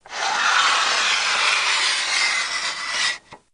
Tripod Leg, Small, Smooth, Medium Metallic Slide

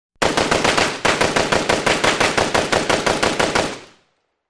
Ak47 Multiple Bursts

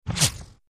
Left To Right Various, bullet bys

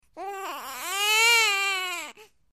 Baby Sniffling Whine

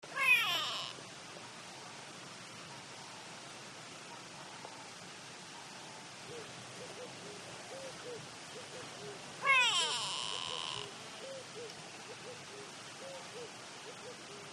Gray Lowrie Go Way B, African

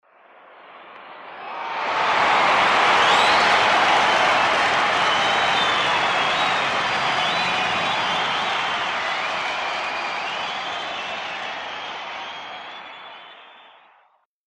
Huge Concert Crowd Ro

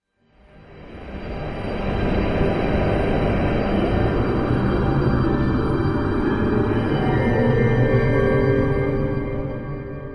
Music Eerie Sustain, orchestral

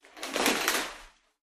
shower curtain; curtains

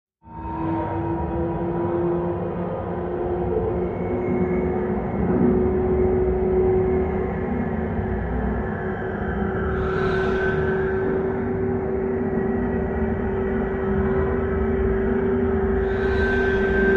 Bells Stretched Out Bel

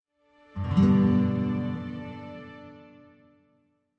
Music Classical Gtr, guitar

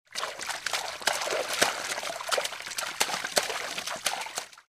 Splashing Around

